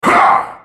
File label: effort; GatekeeperGalio; Character Voices - English